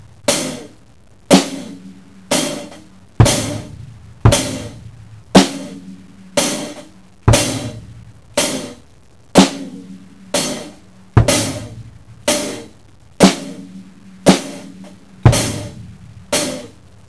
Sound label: fills, drums